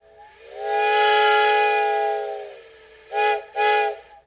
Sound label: whistle, locomotive steam; Minecraft; Unleashed; railcraft